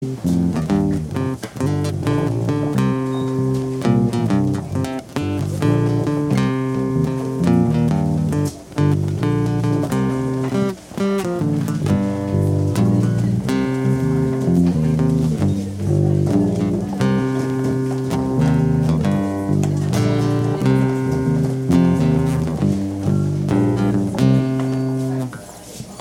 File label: new guitar instrumental; ringtone